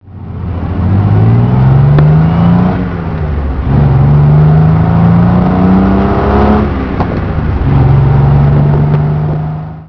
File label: healey mk3 drive